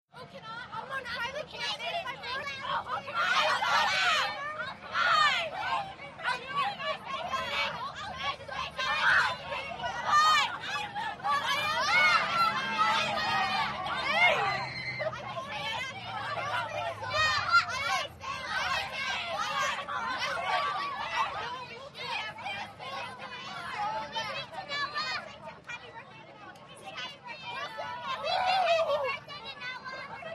Crowd Teenage Girls, small

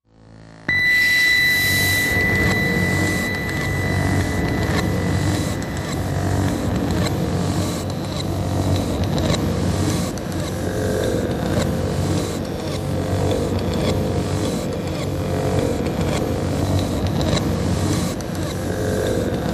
Sanity Recorder Machi, electrical

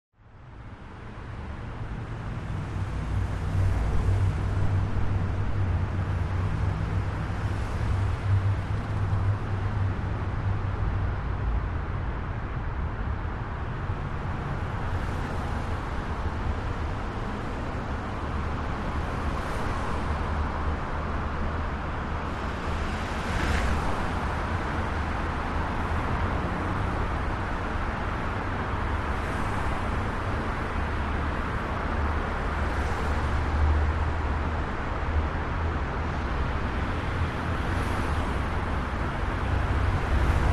Medium City Wash, big city

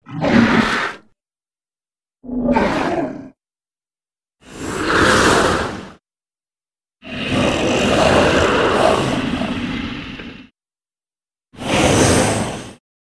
Monster Breath roar

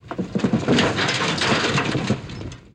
Car Bottom Out; hits & rattles

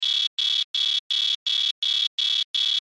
Alarm Beeps

